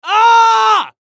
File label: Human Scream; Scream Angry Element Male 20 Yrs; Blastwave